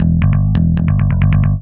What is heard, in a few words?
BASS, Drum & Bass, 135 BPM; Ueberschall; Drum & Bass Resonance